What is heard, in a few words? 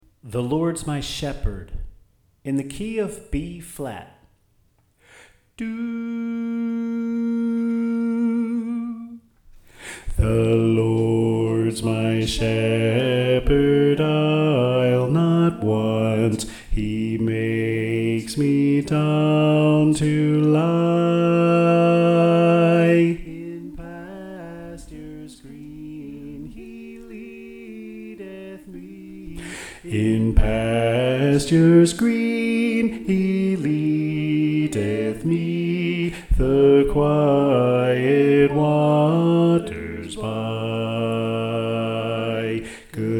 Bass